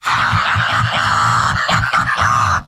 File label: m laugh happy; heavy; tf2; garrysmod; mvm